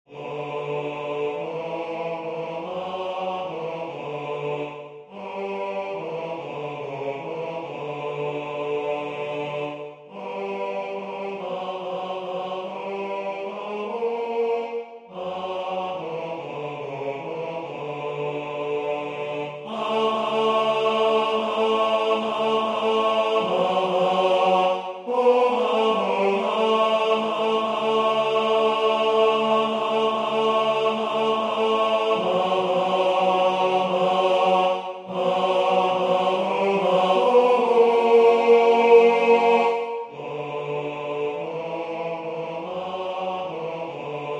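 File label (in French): basse, Musique sacrée; O nuit brillante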